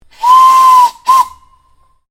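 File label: Train Steam Locomotive 0-2-0 Whistle; Kraus No5682; 1906; Vehicles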